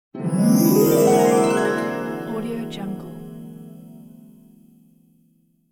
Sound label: fairy princess appearing